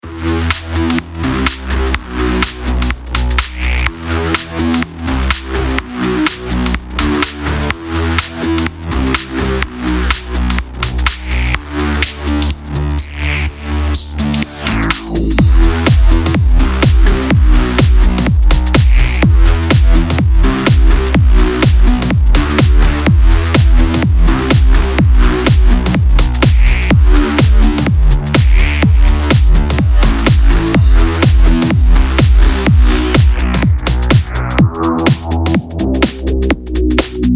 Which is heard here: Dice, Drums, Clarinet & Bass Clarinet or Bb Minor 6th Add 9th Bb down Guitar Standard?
Drums